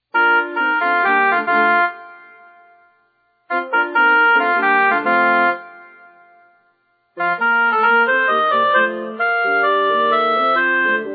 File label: soprano; pfautsch